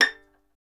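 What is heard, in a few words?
STR violin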